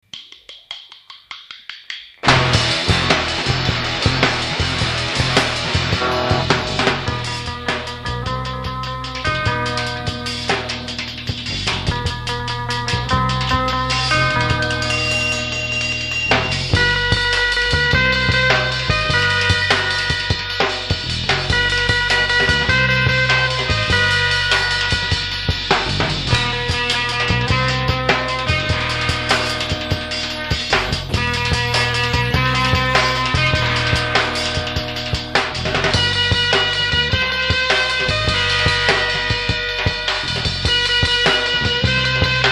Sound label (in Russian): Instrumentalnii bluz